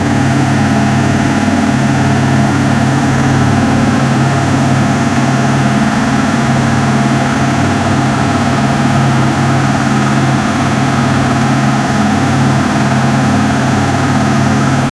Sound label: small boat engine sound